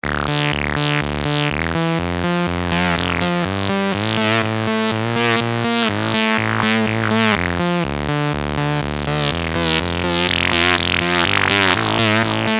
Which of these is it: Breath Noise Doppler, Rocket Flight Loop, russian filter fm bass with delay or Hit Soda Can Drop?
russian filter fm bass with delay